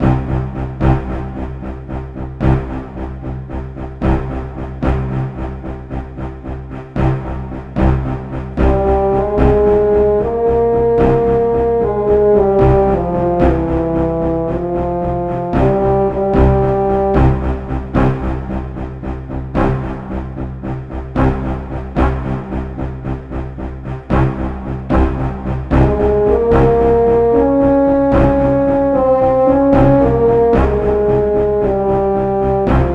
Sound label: music interface; CHAOS DEM